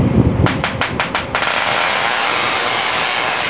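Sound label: Tesla coil arcs; about 2.5 inches around and almost 1.5 feet long; workshop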